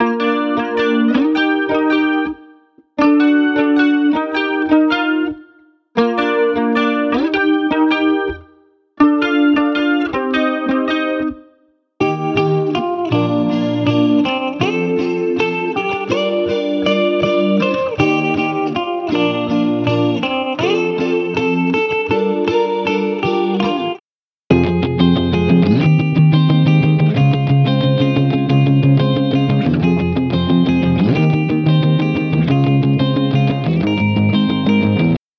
Guitar Loops; Unison

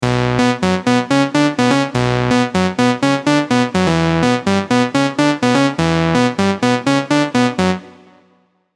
Lead, Techno, Serum; The League; Unison Techno Collection for Serum

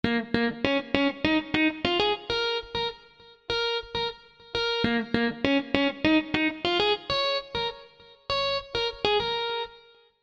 Bbm 110; Defy; Funk MIDI Melody Collection

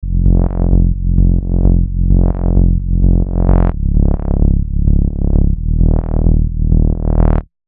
Bass, Techno; Boris; Unison Techno One-Shot Collection

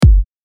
KICK; Volac

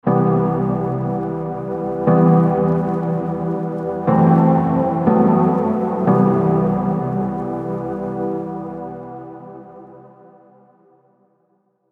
Lydian Prog; Unison Lydian Advanced Scales MIDI Collection

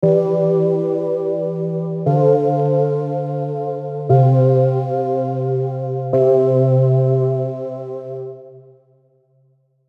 Ionian Prog, Scales; Unison Ionian Advanced Scales MIDI Collection

